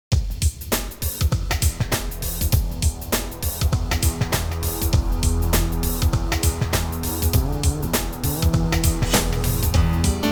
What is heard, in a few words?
Retrowave, Amiga